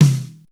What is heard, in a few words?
Snare, Drum One Shots; AMG - Coldcut's Kleptomania VOL-1